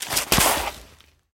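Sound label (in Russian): короткий неприятный звук выстрела из обреза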